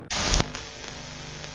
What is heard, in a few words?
vhs startup glitch sound